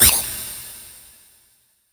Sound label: SHORT, SHORTNOIS